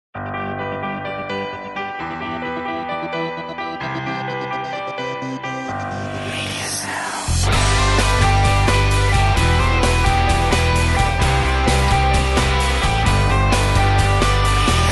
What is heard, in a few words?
Great Outdoors - 130BPM, Rock - Regular